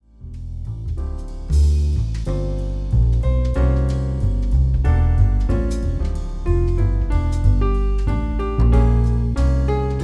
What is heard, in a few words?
jazz trio